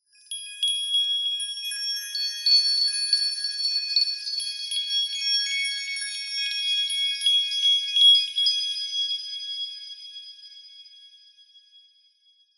fairy dust twinkle sparkle bell tree lower pitched; fantasy